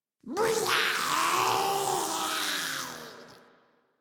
Arm Pain; en; Warframe; Grineer; Ghoul

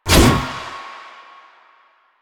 Scythe Spin Whoosh; Weapons; Stalker; Warframe

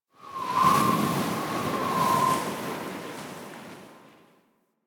Wind Gust Large; Ambience; One Offs; Warframe; Eidolon Plains

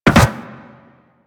Operator Land Soft Metal, Footsteps; Warframe

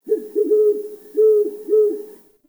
Night Creature Owl; Ambience; Night One Offs

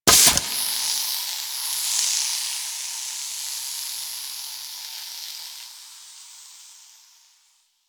Animal Rarity Boost Pheromone; Venus

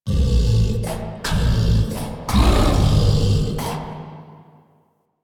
Start Wave; Warframe; Entrati; Mirror Defense

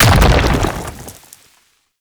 Arm Proj By Explosion; Warframe; Man In The Wall

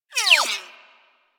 Laser Whizzby; Warframe; Weapons

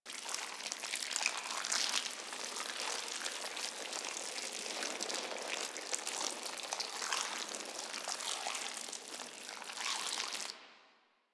Gore Loop Fleshy Medium; Ambience; Props; Warframe; Infested Eris

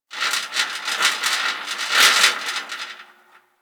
Gas City Rattle Metal; Warframe; Corpus Gas City

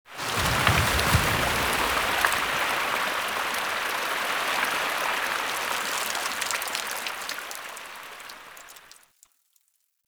Corrupted Sap Water Flow Short; Puzzles; Bean Stalk

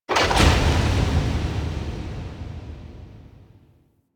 Vault Door Seal; Ambience; Warframe; Infested Eris

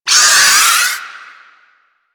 Arm Vocal Wind Up; Warframe; Man In The Wall

